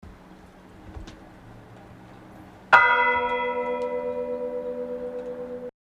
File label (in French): cloche; Mazingarbe